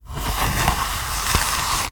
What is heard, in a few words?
medieval seax place in sheath slow; still north media